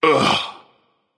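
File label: nonverbal ugh; smite; voicepack; merlin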